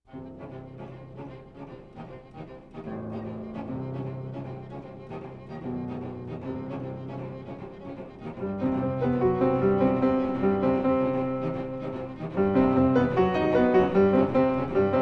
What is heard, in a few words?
Quintet Agitato